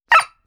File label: dog